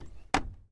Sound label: washing machine open